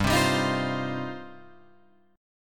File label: G 7th Suspended 4th Sharp 5th, Guitar Open F; G7sus4#5 strummed